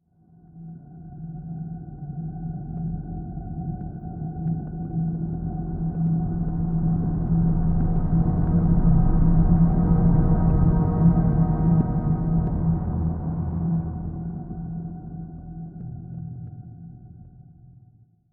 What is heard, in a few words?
music - mystery; You Are Empty